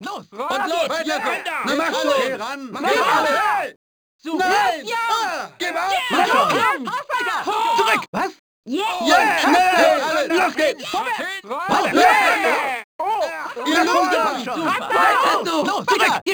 play, Crowd German

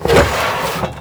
health; WhiteOut

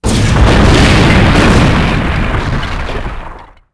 impact; Quantum Redshift

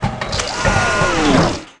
Mech Death Slump; Tallan Mech; Aliens

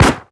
cardboard hit, bullet hit; projectiles; Max Payne

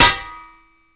hit

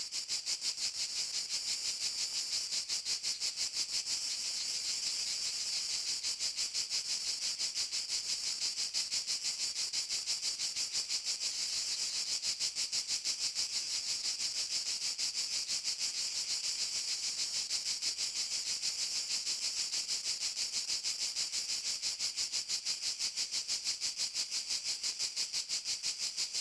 ambience; Street Hoops; XBOX

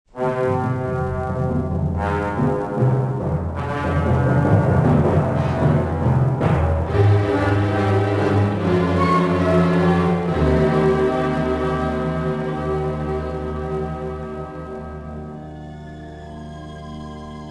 dark; Star Wars Jedi Knight I Jedi Academy; XBOX

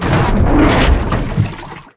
Pipe Flap; Finding Nemo; XBOX

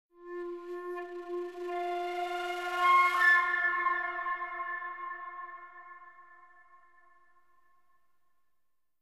pipe; Myst III Exile

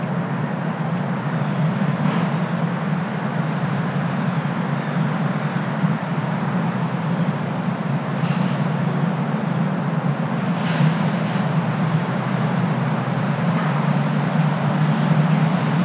city light, Ambient; Project Gotham Racing

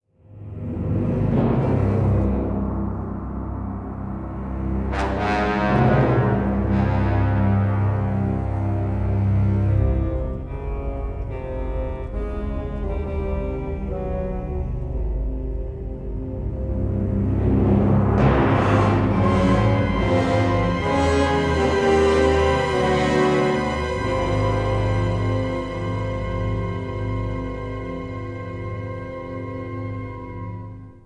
tension, music

